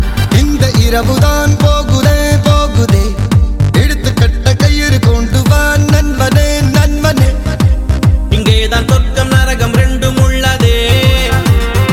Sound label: New Year Bgm